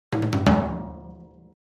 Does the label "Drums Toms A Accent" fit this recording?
Yes